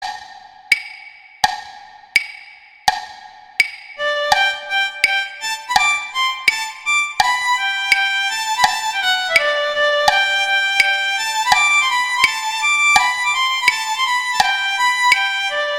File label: Allegretto Guitar; Paganini; Sonatas Op1 Vl Gu; Sonata No 2